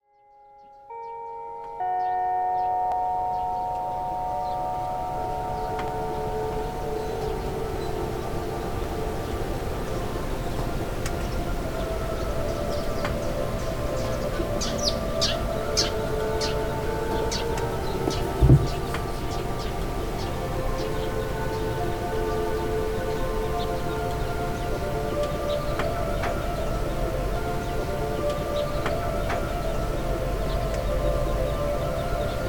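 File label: noon time signal, second half; Chino Cultural Complex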